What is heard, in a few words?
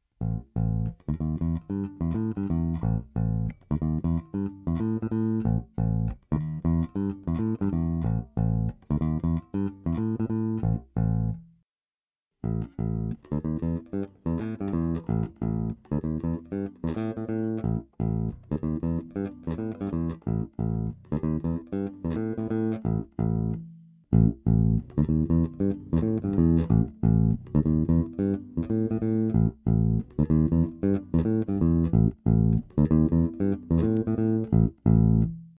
groove